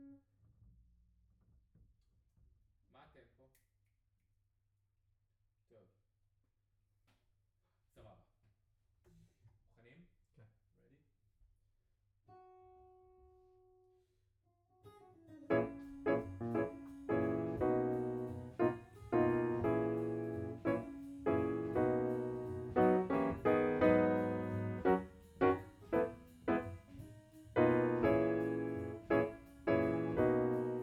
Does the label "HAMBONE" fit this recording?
No